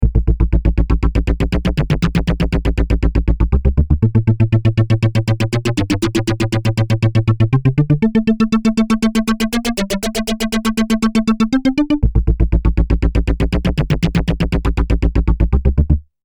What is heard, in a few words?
synth